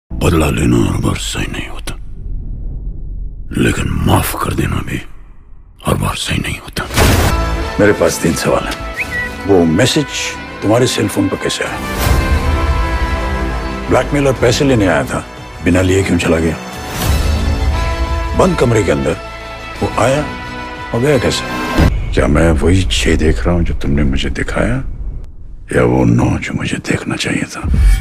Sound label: bollywood ringtones